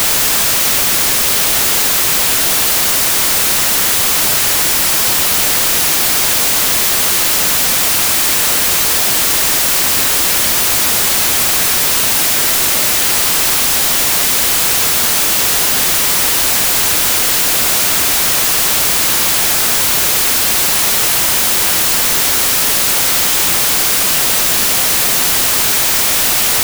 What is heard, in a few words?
whitenoise left; test signals